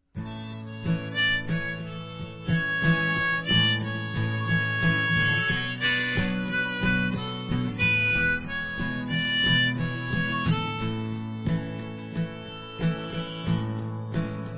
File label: Acoustic